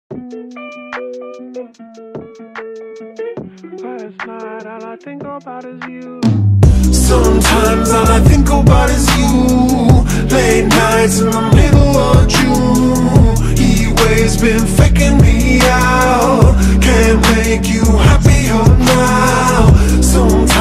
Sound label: sped up pitched